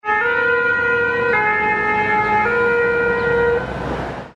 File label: Police FR